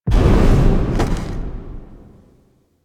Land; Cinematics; Stalker; Warframe; Orbiter Cradle

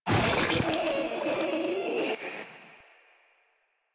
Infested Ancient Attack; Warframe; Ancient Ninety Nine; Proto Infested Ancient

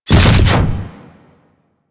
storm body slam; Warframe; Warframes; Ninja

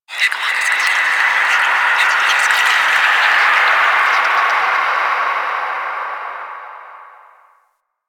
Moon Whispers Small, Ambience; Warframe; Orokin

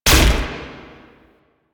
Rifle Shot; Duviri; Incarnon Adapters

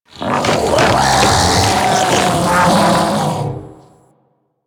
Death, Infested; Enemies; Barks; Warframe; Ancient Shell Agent

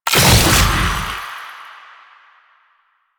Fuel Projectile Super Pick Up; JadeShadows; Ascension

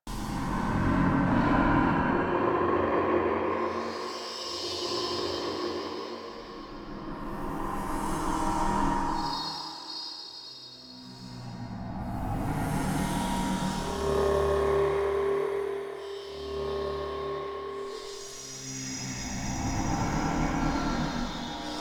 Interior Bed Loop Medium

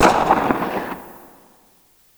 Tail, Weapons; Lato Vandal

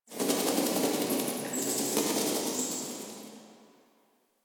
Cave Bats; Ambience; Warframe; Stalker Cave

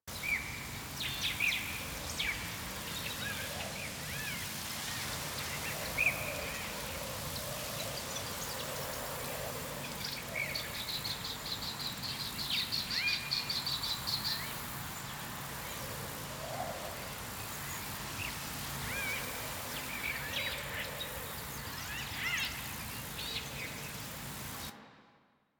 Camp Valley Day Ambient Loop; Warframe; The New War; Drifter Camp Valley

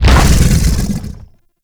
Biped Vocal Death; Warframe; Man In The Wall

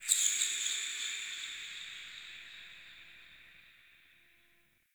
Under City High Creature Echo

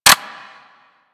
M16 Rifle Mech End; Grineer

